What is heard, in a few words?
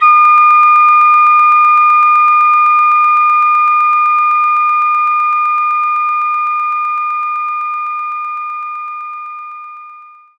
Whistle D5; knights try